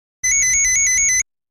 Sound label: telephone